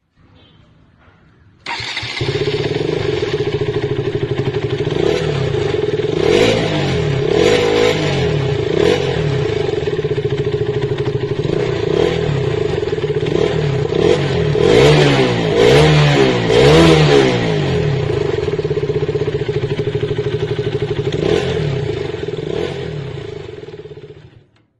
Mobile Ringtone

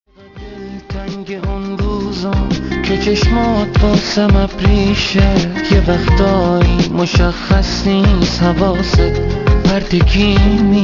Mobile Ringtone

